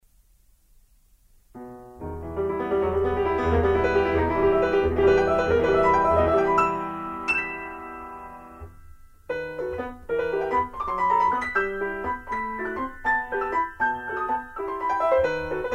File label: Waltz in E, piano; Chopin Op Posth